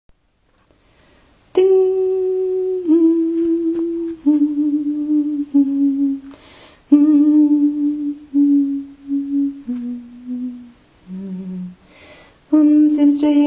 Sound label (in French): voix basse; carol of the bells